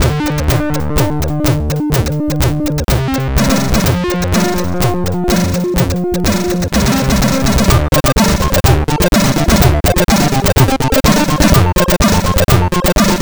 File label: c64 remix; last ninja